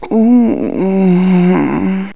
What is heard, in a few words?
SEXVOX; X-Static Goldmine 1